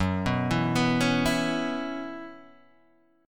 F sharp 7th Suspended 4th, Guitar, Open G

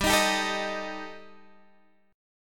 G Suspended 2nd Sharp 5th G, Guitar, Drop D; chordshape; down

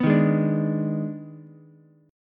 Eb Major 7th Suspended 4th Sharp 5th Eb, Ukulele; strummed; EbM7sus4#5

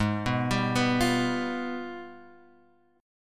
Ab Minor Major 13th, down, Guitar, Open G

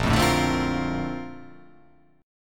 Bb Major 9th, Bb, down; strummed; JGuitar

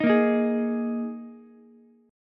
Ab Suspended 2nd chord, Ukulele; 8,10,11,11; strummed; JGuitar

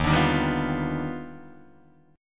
D sharp Augmented Major 9th D sharp Guitar Open D

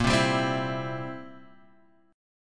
C Major, Bb, chord

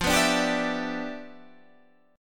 G 6th F; G6/F strummed

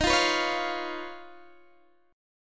Eb 7th Suspended 2nd Sharp 5th Eb, Guitar Open D; down

